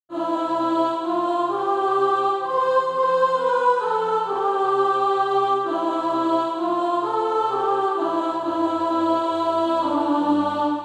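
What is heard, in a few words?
soprano, SATB Practise Music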